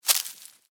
Footstep: Individual Footstep On Dry Leaves; Footsteps; Human

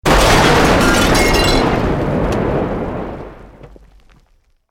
Building Collapse Medium; Created dramatic effects of buildings collapsing or breaking; Construction

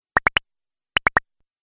Idea Bubble Pops, cartoon; Cartoon Sound Design

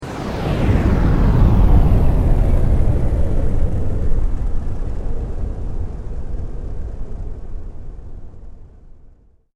Sweep Mechanical Slow

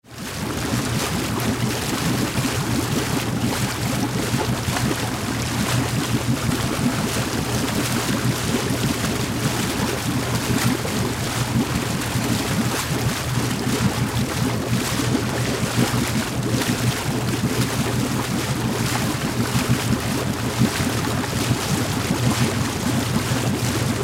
Catamaran Drive Away Bubbles; Transportation Watercraft; Boat Sound Effects; Blastwave

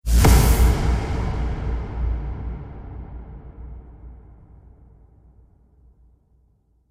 Cinematic Dark Hits; Hit Sound Effects; sound design

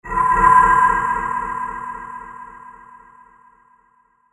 Whoosh Dark Flange Reverb; Blastwave; Whoosh Sound Effects

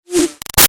Arrow By Zip; Bow And Arrow Sound Effects; Bows and Arrows; Combat; Big Room Sound

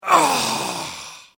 Human Death Male Dying Sigh Grunt; LYN116 package of human sound effects

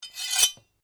Blade Scrape Flick, Sword; Foley sounds for melee weapons; Swords; Combat

